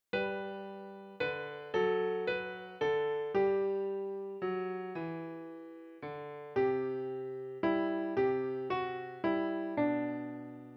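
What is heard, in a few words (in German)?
Leise rieselt der Schnee Klavier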